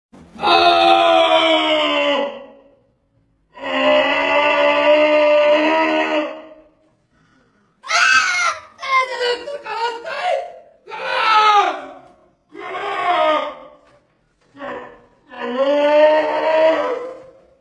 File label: wigeon